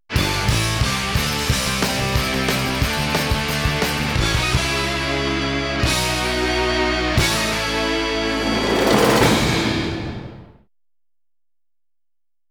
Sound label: 10 Second Instrumental Theme; Power Rangers Lost Galaxy; Fox Kids Countdown